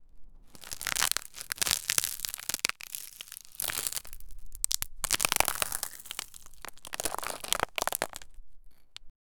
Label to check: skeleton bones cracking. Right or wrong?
Right